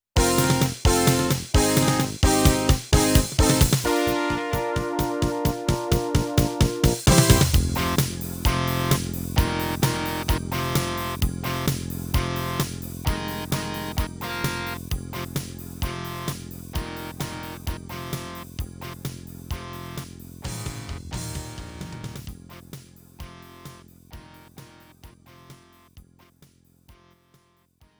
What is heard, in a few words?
GOAL; POLESTAR